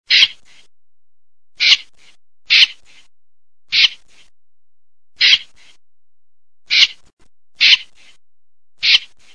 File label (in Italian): beccaccino